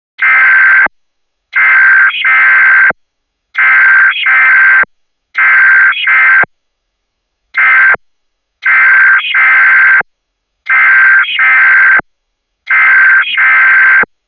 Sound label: space station alert coll